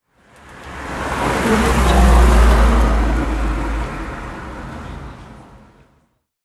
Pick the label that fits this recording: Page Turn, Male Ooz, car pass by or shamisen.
car pass by